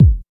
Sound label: fluffy kick single hit c key